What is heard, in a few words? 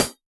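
hip hop closed hat; Dr 202 Kit 1; Percussion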